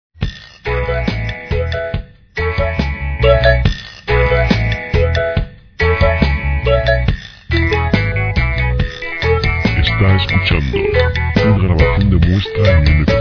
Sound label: pop